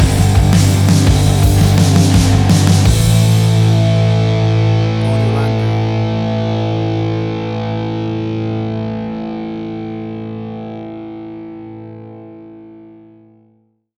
Big Stadium Rock Stinger

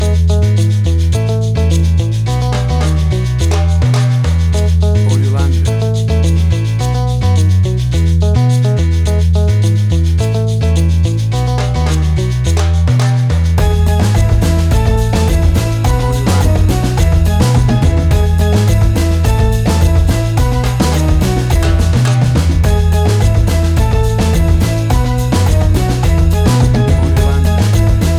African Reggae Fusion

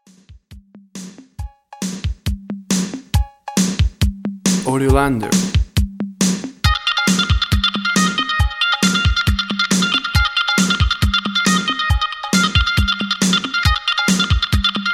80s Power Pop

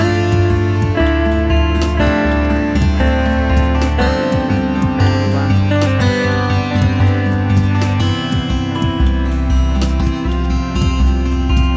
Acoustic Mystery

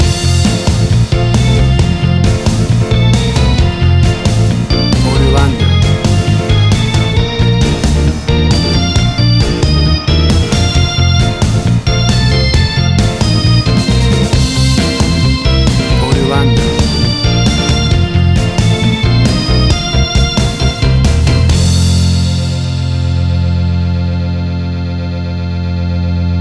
60s Swing Surfing

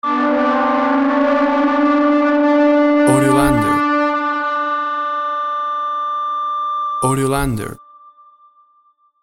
Witch Scream MID

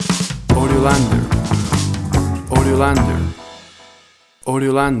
Cool Attitude Upbeat Stinger

